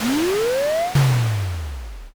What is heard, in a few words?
missile